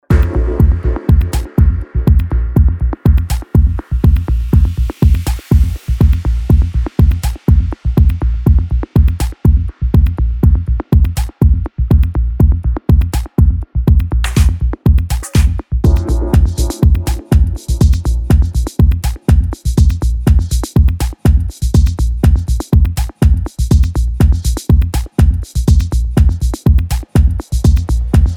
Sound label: GROOVE